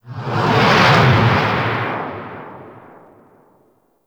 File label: Asteroid Pass, Fortress Ambience; Warframe; Grineer